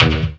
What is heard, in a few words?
Synths D#; Vengeance Samples